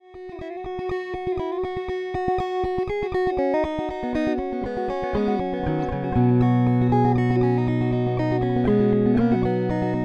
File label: electric banjo clawhammer